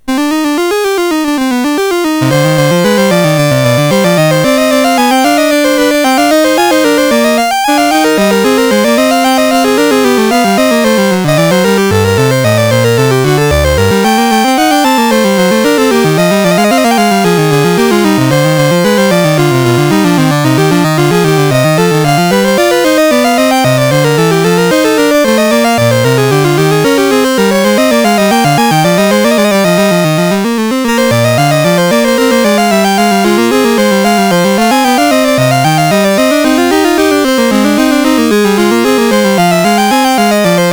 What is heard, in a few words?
Fantasy in C Minor, MOS6581R4